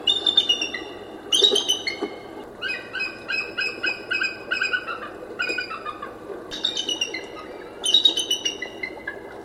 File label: Bald Eagle